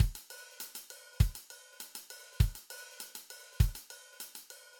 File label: hat pattern